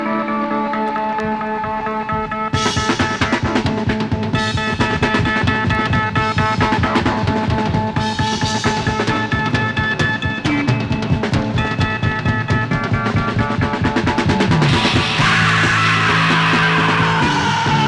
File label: Drum Solo; Super Groups In Concert 1982